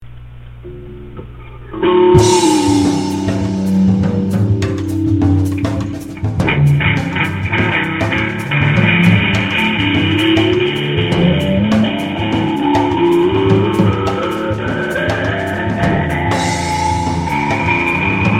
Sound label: midnight awakening with drums